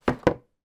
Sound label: foley pizza box down on table; zapsplat